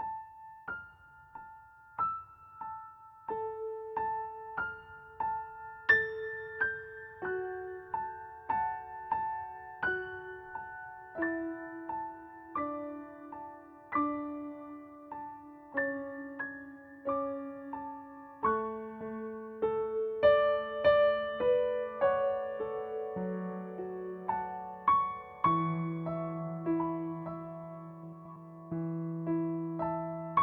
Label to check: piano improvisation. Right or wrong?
Right